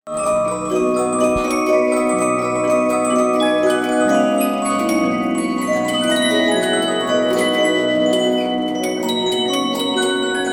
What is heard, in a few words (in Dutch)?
muziekdoos met plaat; geluidenquiz